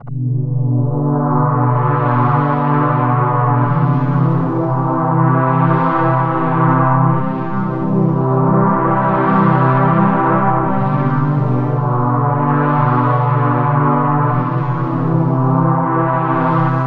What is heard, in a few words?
PAD1, INST LOOP, House Side Of 2 Step; USB Soundscan vol.51; AKAI